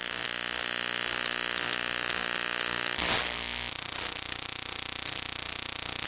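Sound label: ambient hum buzz loop, electric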